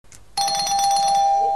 correct bell; gaki no tsunami kiki

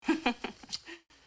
giggle

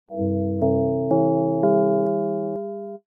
gong

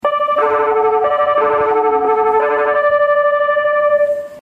E353 series horn

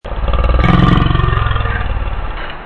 growl yikes

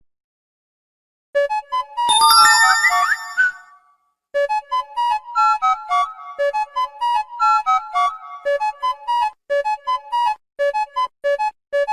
goofy phone

